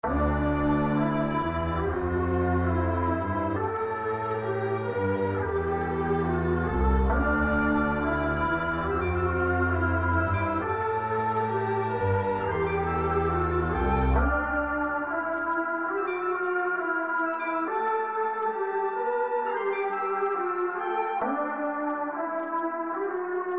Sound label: Sparta 136 A minor dark latin trap